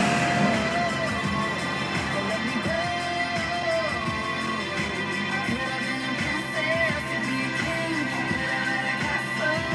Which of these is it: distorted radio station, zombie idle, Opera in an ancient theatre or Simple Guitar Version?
distorted radio station